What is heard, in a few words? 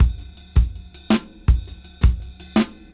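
Funkragga 82 BPM Reverse; AMG - Keith LeBlanc Phat Butt Jams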